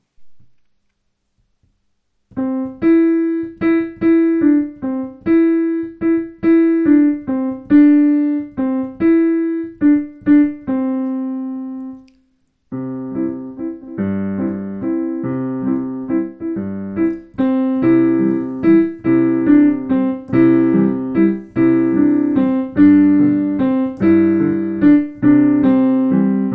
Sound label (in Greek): Trigones piano